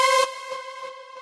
Techno Sound

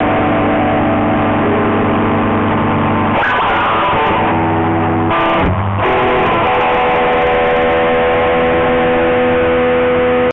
Guitar Noise

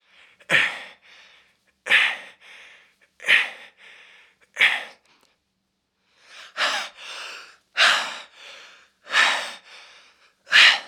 Breath out swimming

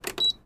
Button Press

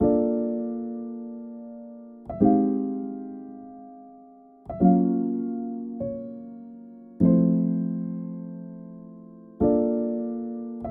Small Lofi Piano Loop

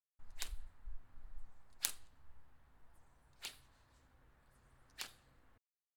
Fishing Rod Whip

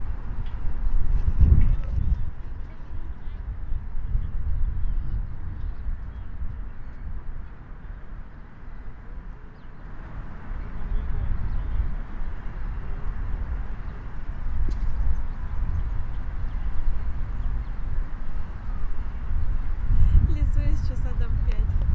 Park Zaabil In Dubai Ambient City Park And Birds

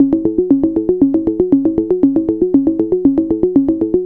Arploop

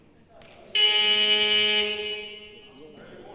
Buzzer Sportif

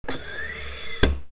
Hydraulic Office Chair Moving

